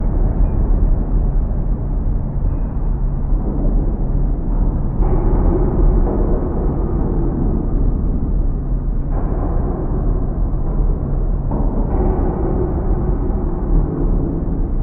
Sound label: Rumbling Low Ambience, sci-fi ambience